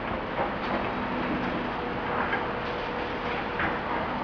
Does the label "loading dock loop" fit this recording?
Yes